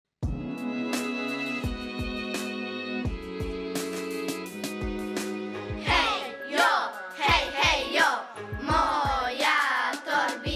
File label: RAP; OS SKEDENJ SV. JAKOB